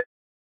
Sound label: ui hover